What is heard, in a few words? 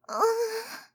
Moan